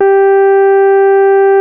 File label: RHODES; Best Service Advanced Media Trax Modern Composer